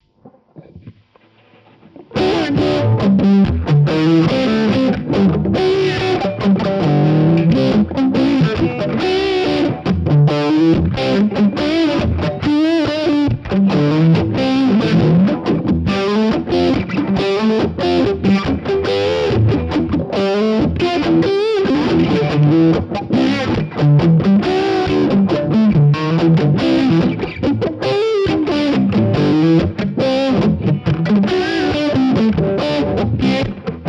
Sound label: Jam